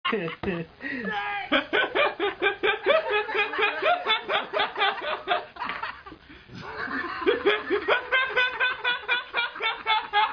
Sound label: haha